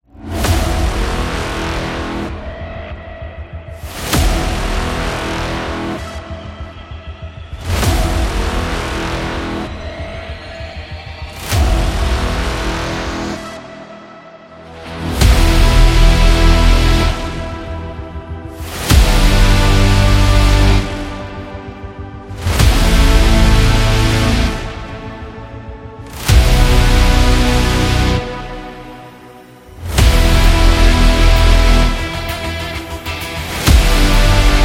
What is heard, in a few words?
Epic Rock